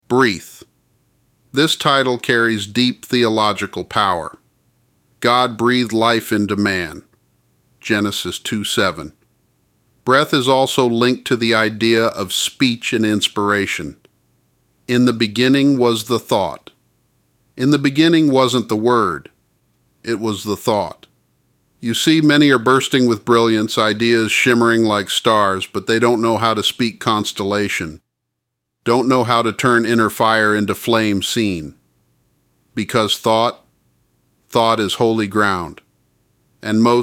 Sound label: BREATH Long